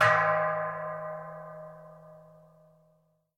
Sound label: gong strike; amb; angband